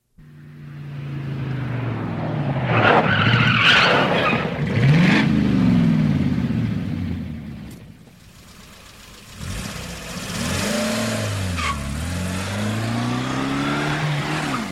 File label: Car 3d Sound